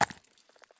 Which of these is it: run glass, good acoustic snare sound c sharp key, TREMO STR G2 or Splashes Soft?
run glass